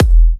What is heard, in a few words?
Kicks E; markus hakala